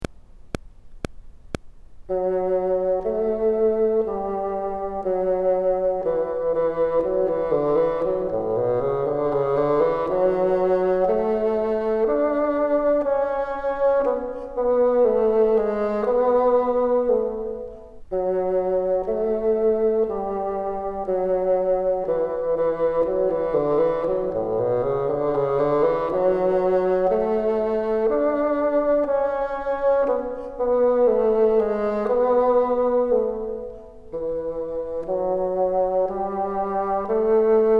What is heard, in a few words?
top, bassoon